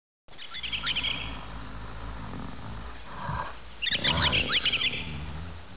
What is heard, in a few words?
BIRD; Talking E-Mail